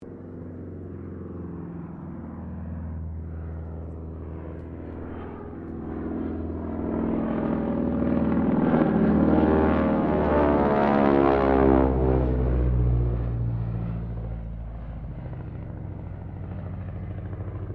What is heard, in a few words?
Aircraft AT 6D Harvard Mk III pass bank pass, American military training aircraft; Airplanes